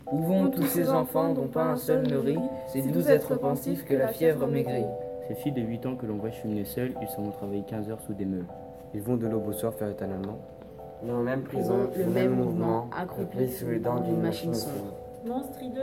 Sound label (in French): fond sonore